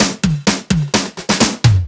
Fills 128BPM, Clubsounds

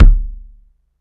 BD SOFT, Techno 138 BPM

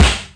SNARE LOW; Greytsounds; Scott Peers Sound Engineering

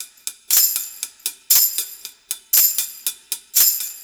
Perc Kit; Copshow1975

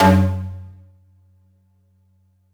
attack F#1, Yamaha VP-1; Music Store; VP-ATTACK

